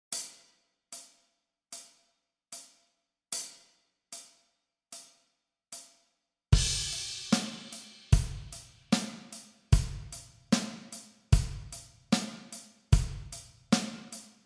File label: 12 bb 075 bpm